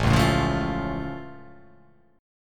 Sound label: A Augmented A down